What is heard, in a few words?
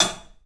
HAT, CLUBHAT, 3D Drums; 3D Sound